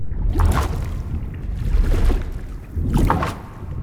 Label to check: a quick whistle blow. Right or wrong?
Wrong